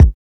hot kick d key, Royality free kick drum one shot tuned to the D note. Loudest frequency: 134Hz